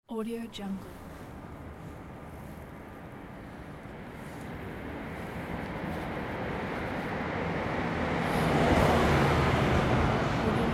street ambience

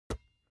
sport basketball catch finger tips hard

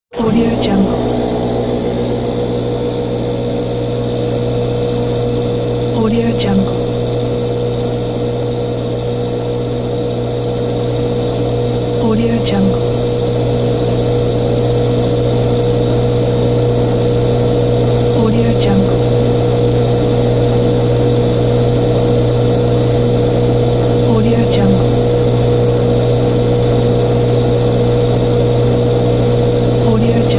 compressor

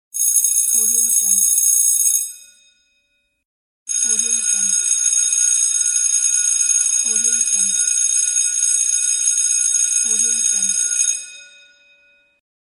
school bell

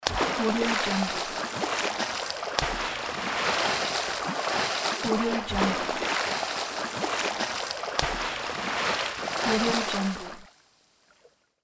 swimming; danielmix

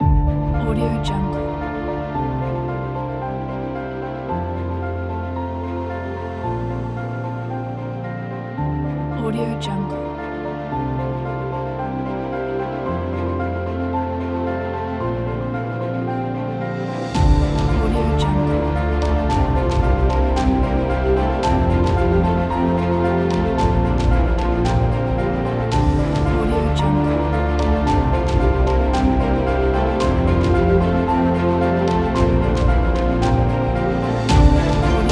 motivational epic

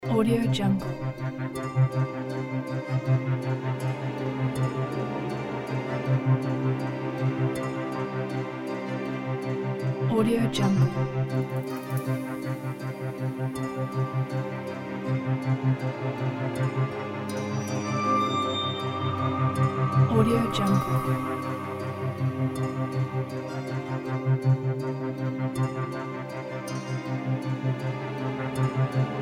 adventure game tension loop

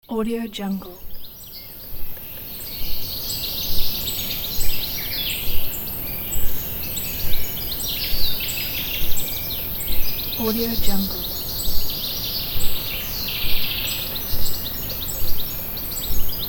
forest birds

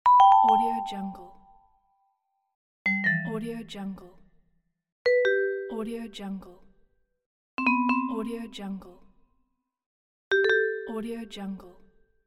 vibraphone massage

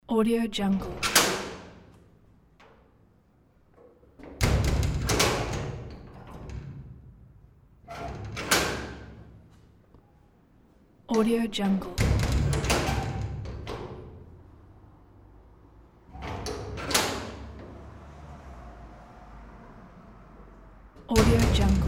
big metal door sound pack

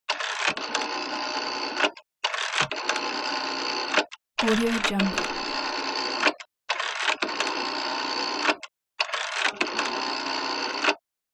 rotary english phone being dialed

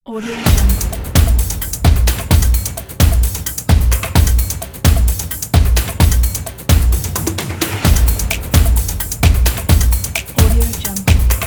drum stomp ident

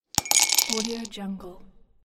putting multiple dices in glass

